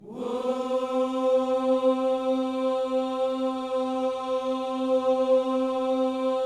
WHOO C, Gospel; Spectrasonics; Vocal Planet Vol.1 Gospel; akai

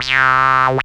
SYN TON; E-Lab; X Static Goldmine 2; akai

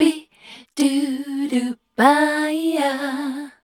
Voice Spectral MELODIC #D

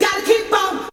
VOC

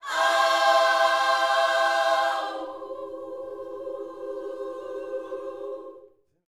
Vocal Gospel PHRASE

